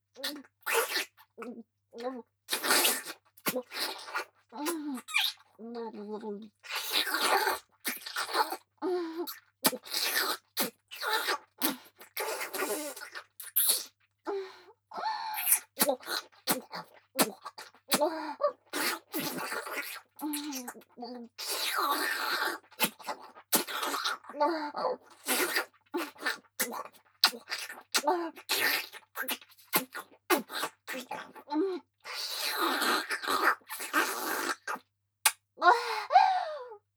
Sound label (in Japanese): フェラ; アスティリア